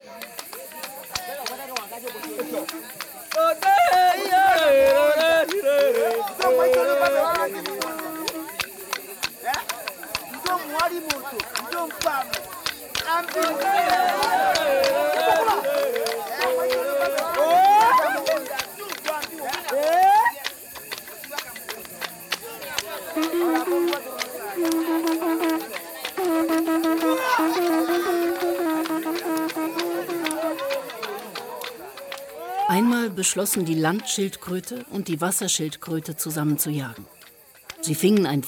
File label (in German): Die Stimme der Pygmäen